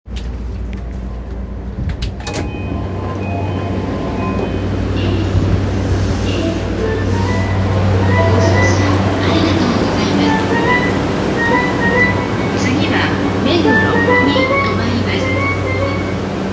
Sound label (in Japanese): JR山手線恵比寿CCW